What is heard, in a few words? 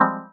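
Fmadd9 chord; PineBark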